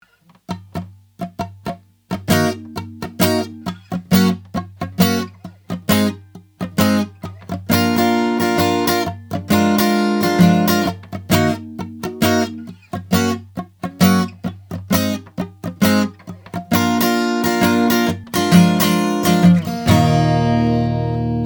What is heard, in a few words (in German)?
Offbeat Gitarre Britstrip